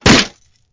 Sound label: break; Angry Birds Space